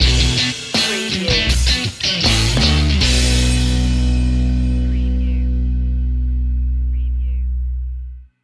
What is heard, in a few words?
rock life stinger